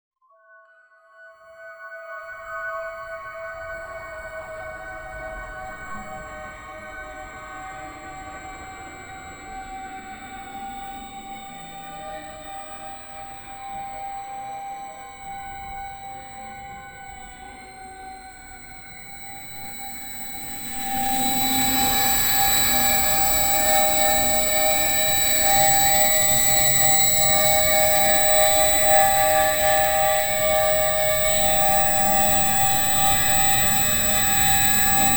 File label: magic energy chiming, building intensity